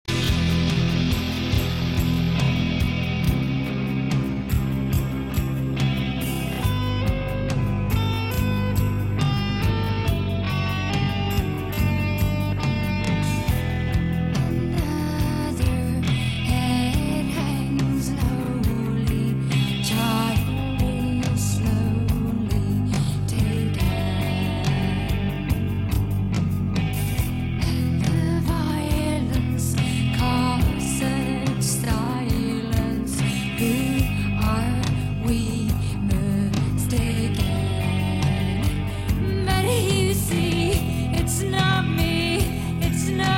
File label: solo